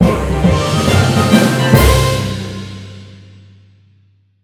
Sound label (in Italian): fanfara; Loot Hunter